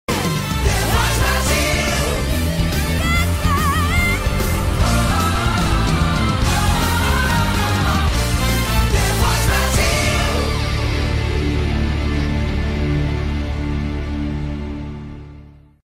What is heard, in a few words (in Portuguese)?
vinheta; the voice brasil; globo